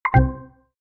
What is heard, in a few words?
charge off; Kyocera BASIO4 KYV47